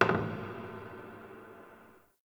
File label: Wooden Creak Small; Warframe; Ambience; Nineteen Ninety Nine